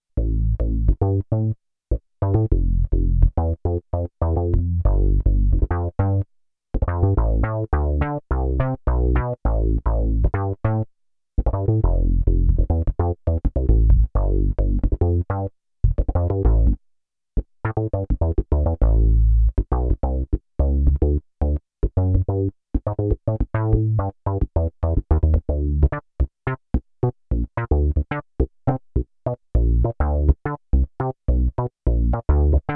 Synth Bass, Roland JX-8P

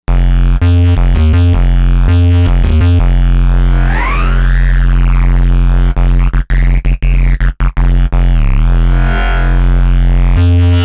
Bass Destruct; Novation UltraNova; Factory Patches

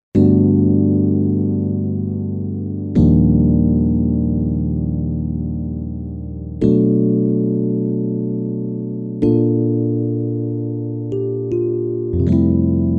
Suitcase

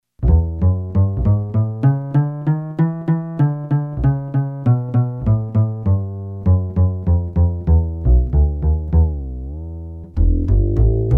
Upright Bass

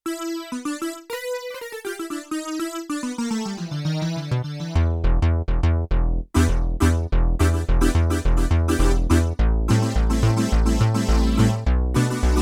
SYNTH BANDSTAND, Yamaha TX16W; Combo Performance 1

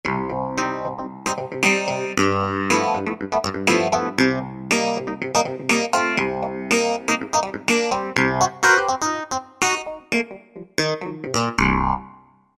V-Lo Klaver; Factory Patches

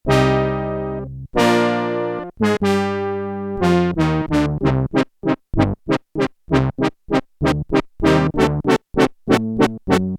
BRASS; BRASS-III

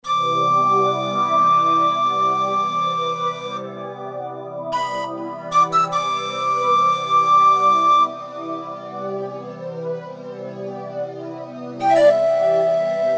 New Age Pipe, Roland SC-880; Factory Patches

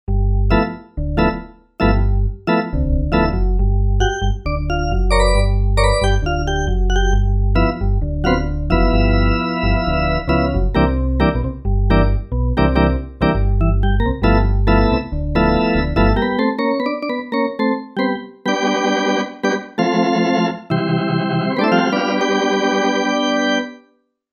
E.Organ; Factory Patches

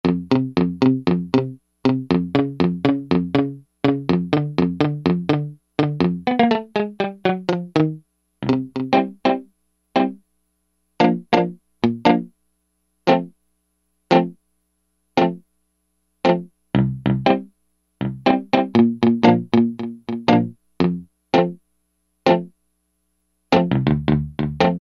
Stereo Mutes; E-mu Proteus-1; Presets

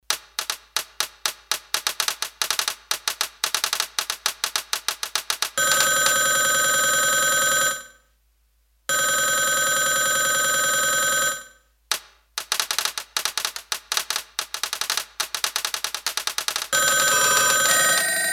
Office Operator; Factory Patches

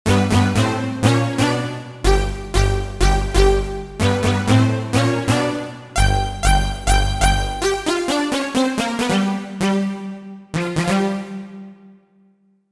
Brite Stab, Roland JV-880; Factory Patches

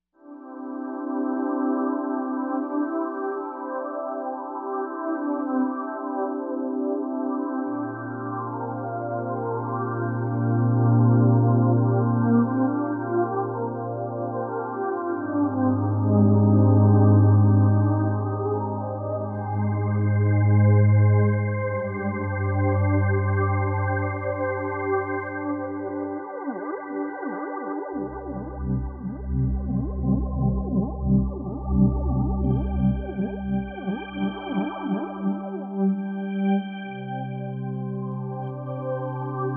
3D Flanged

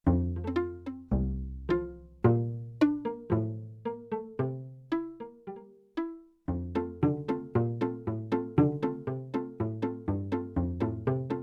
Middle Pizzicato; Yamaha TX16W

